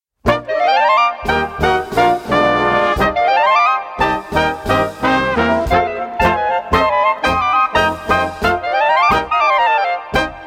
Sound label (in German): Klarinettengalopp